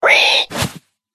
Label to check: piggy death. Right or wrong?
Right